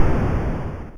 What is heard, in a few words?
Error; Asteroids Deluxe